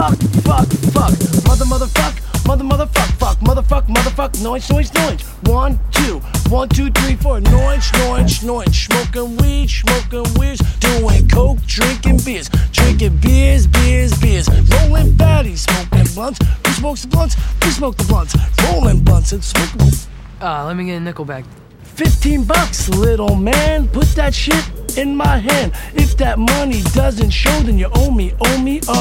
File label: Screaming